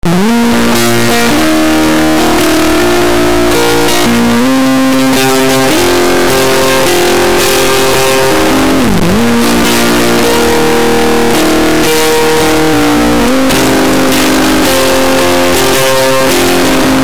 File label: heavy distortion